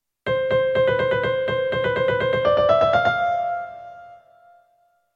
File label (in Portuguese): polifonia